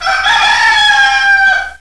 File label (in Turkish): horoz; hayvanlar